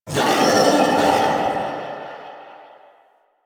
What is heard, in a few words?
Feral Vocal Call; Enemies; Kubrow; Warframe